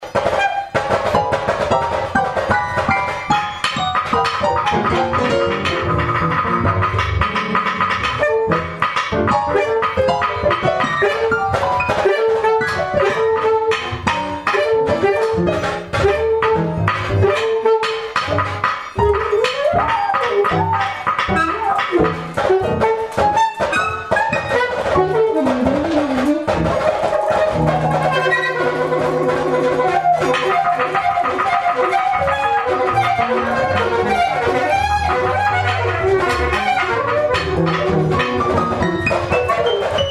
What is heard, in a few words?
sax session